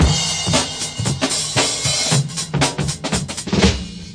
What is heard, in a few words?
bright smile crash, 116 bpm